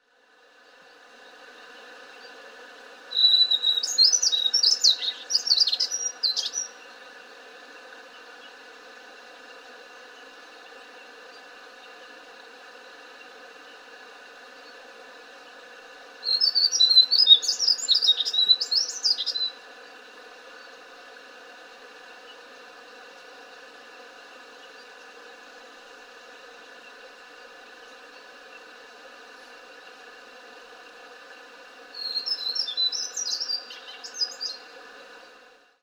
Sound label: Himalayan Shortwing Brachypteryx cruralis, himalayan shortwing call